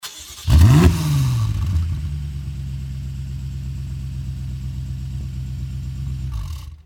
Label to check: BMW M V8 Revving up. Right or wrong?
Right